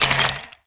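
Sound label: skeleton mage yell; monster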